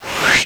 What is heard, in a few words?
Jump, bad vocals